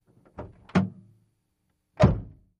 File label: Car Door Oc Large Door